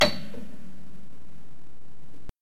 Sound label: Piano Highest